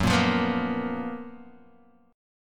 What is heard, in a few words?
F sharp Major 11th Guitar DADGAD